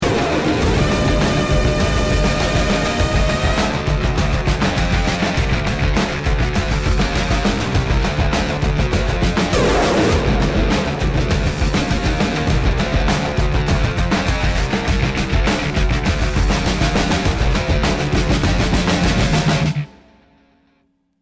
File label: start action, music; halflife alyx